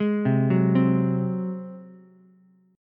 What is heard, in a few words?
F sharp Suspended 2nd Flat 5th, Ukulele; arpeggiated; 1,0,2,3; down